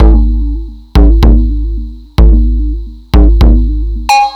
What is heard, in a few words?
Drums Strange